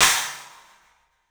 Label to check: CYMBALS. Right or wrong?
Right